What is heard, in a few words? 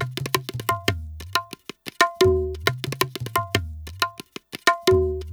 ETHNO EXTRA 90; ethno2